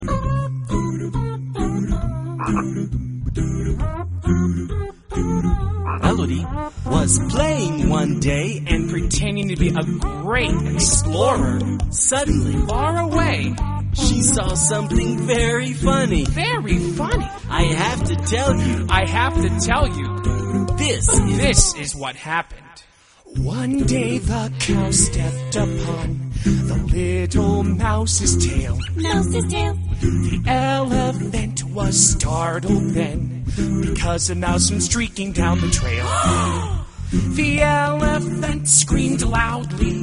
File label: melody